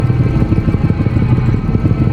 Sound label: masochism loop; Dr. Mundo